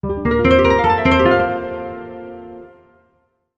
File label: Music Logo Guitar